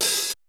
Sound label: Percs